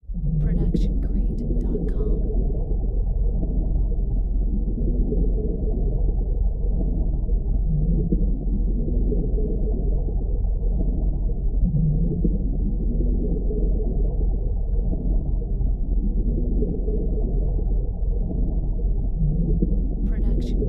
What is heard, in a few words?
underwater ambience; soundscrate